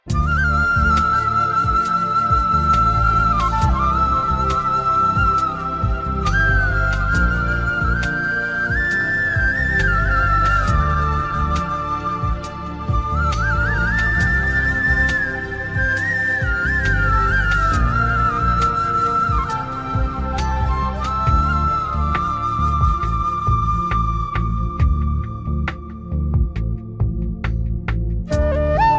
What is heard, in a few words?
best flute ring